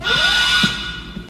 big door opening alarm

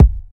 old school phat kick one shot g sharp key

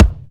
smooth steel kick drum sound f key; kick drum samples

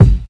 studio kick sound G key

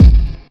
tight lows steel kick drum sample a key

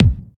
crispy kick drum sound f sharp key; kick drum samples

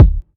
fluffy bass drum sample d key

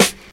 clear snare sound g key

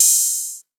subtle open hi hat one shot a sharp key

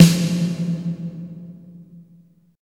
warm sounding acoustic snare f key; snare drum samples

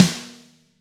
rich low end snare single hit f key; snare-drum-samples

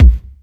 raw kick sample a sharp key

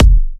smooth trap bass drum single hit e key

